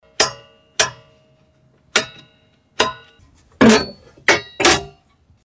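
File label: knife switch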